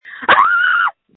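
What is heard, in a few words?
Scream, phone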